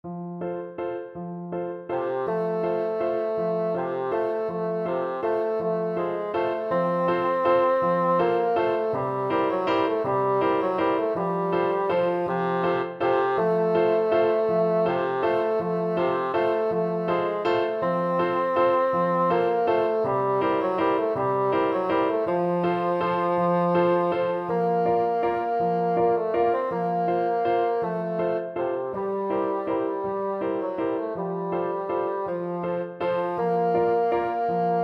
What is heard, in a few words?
verdi traviatta, bassoon